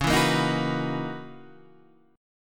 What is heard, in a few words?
Gb 13th chord shape